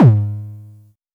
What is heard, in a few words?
High Kicks G#2; LIMAT Div Dept; Drums